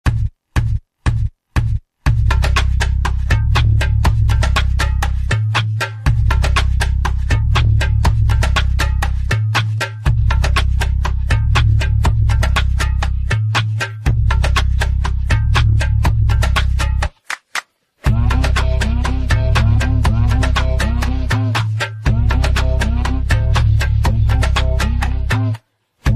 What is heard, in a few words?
Instrumental; Countries: America